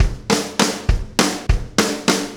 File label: Acoustic Fill, Drums